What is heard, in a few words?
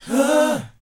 BOYZ, R&B Dance; Spectrasonics Vocal Planet